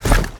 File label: Tongue Grab Pull Back; Evolve; Behemoth